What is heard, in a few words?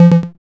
bbounce; pong